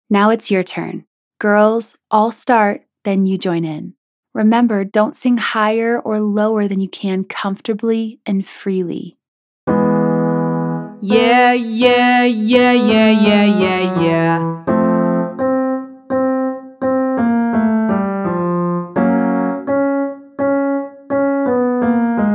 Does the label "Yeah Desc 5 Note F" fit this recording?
Yes